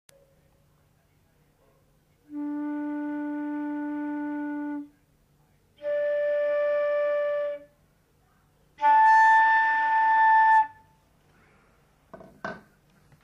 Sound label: Flute